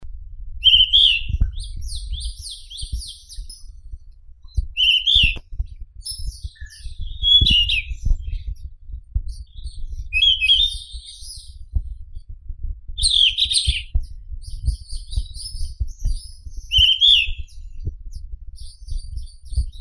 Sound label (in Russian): muholovka zheltospinnaya ficedula zanthopygia